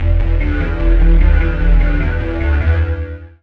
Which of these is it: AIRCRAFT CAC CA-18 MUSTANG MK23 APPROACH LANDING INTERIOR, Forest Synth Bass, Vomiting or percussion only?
Forest Synth Bass